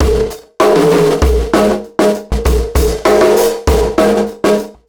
Beat, Processed Drumkits; Event Horizon

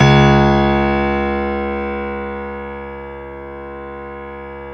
StereoGrand, Keyboards

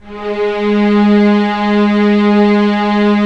STR, STRING; Roland - LA Composer vol. 1

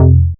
bass classic echo F; Polyend; ARC Noise

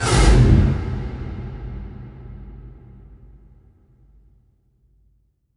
impact flame, Cinematic Impact

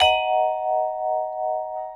VIBES; Houseworx

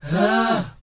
BOYZ, Vocal, R&B Dance

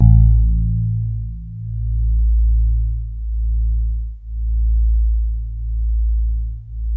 DX7 SOFT A1, E-PIANO; Music Store

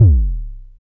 FX Analog; Sound & Vision - Gigapack II

